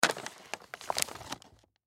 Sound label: Present Pick Up, Present Pick Up Wrapping Paper Gift; Foley; Ripping; Objects; Blastwave